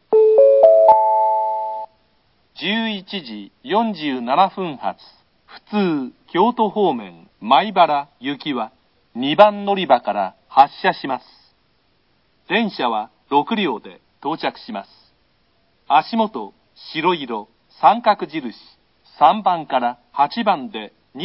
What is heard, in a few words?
shimamoto track2 local maibara, eki; nishinihon